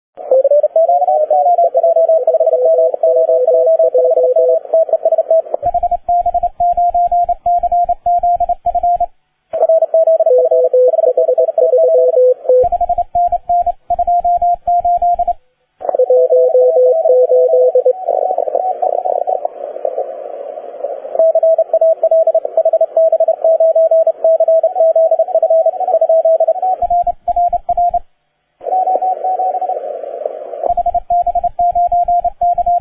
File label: cw; All 3 QSOs on Sunday.